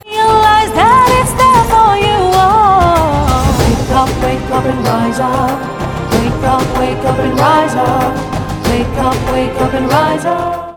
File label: wake up wake up and rise up english